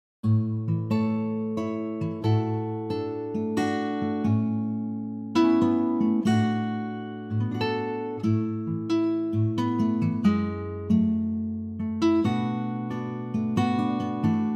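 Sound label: A to Bb Acoustic Guitar; key - A to Bb - vocal range - B to D; Gorgeous and intimate acoustic guitar arrangement of this wonderful gem from The Muppet Movie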